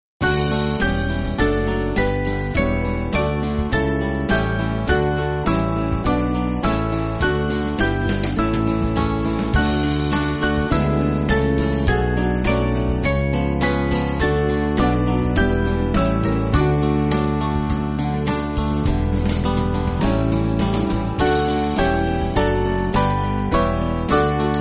Band; Vesper (Stainer)

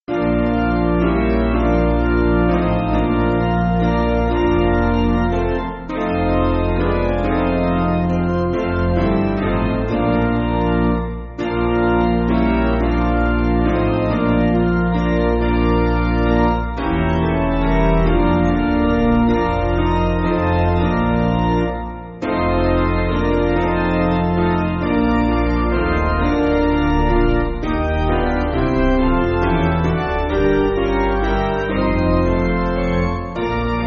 Piano, Music Backing Files for Churches; Basic Piano & Organ (CM)

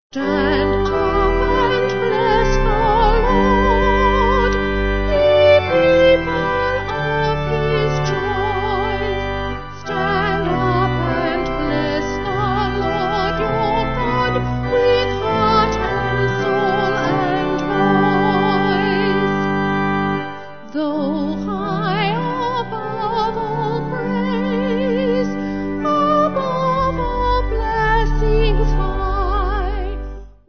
Vocal Organ

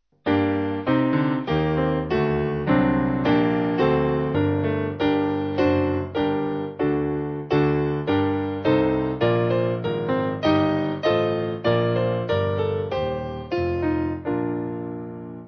Piano; Ah Holy Jesus; Herzliebster Jesus

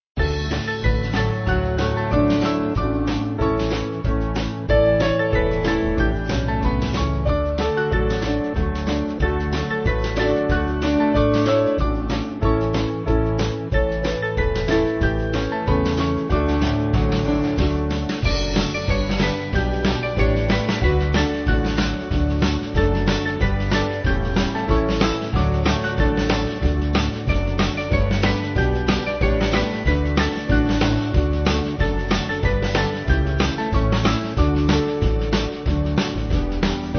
Band, Music Backing Files for Churches

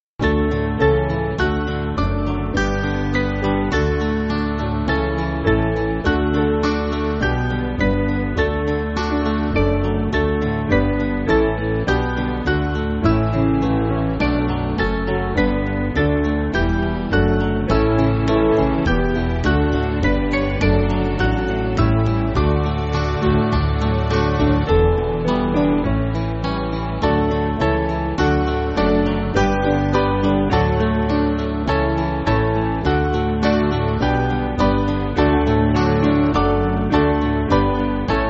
Band, Backing Files for Churches; Would You Know; Wollt Ihr Wissen

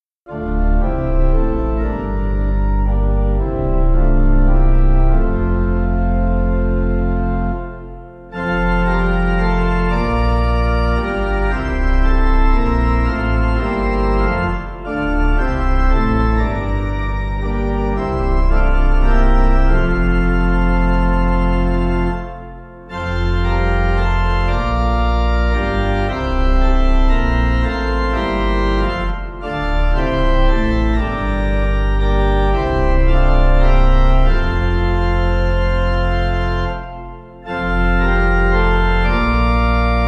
Prayer To A Heart Tilak Pipe

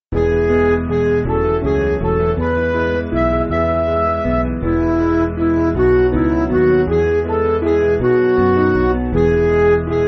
Midi

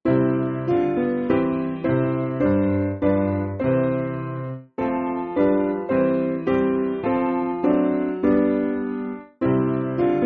Supplication, Piano; Father Lead Me

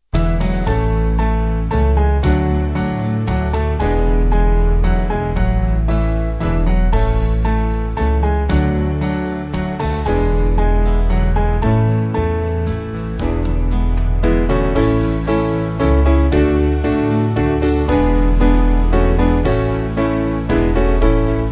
Band

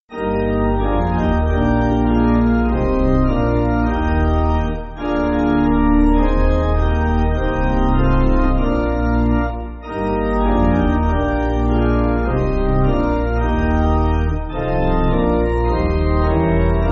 Pipe, church music

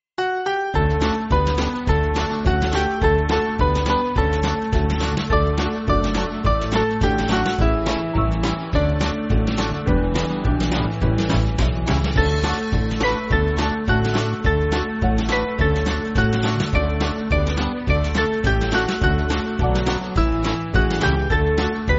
Band, Music Backing Files for Churches; Hunter

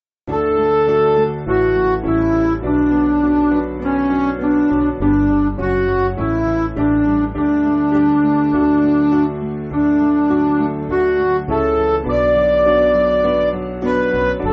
Midi

